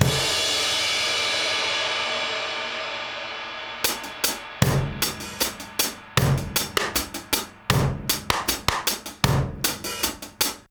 DOWN KIT 78; AMG Gota Yashiki Groove Activator

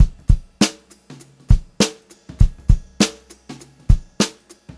VAR, Funk-Groove Drumloops

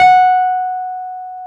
PNO, Wurly

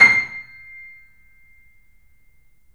C -L, The Piano; InVision Interactive - The Piano

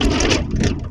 SHEETMATAL, MACHINES

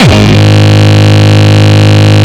Longkick, kicks

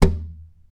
TAP, FLAMENCO Guitars

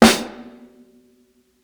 60s SNARE AND HH; Basement drums vol 2

